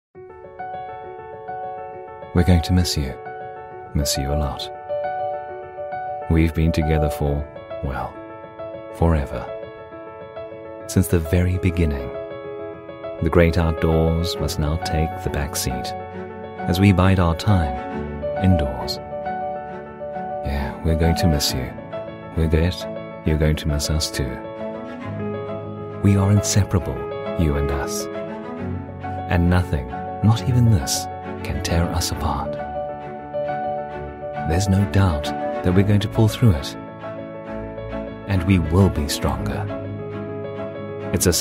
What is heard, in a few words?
Music; Nissan